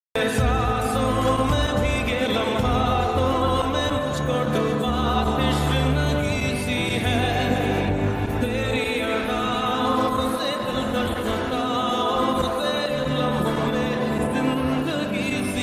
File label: romantic ringtone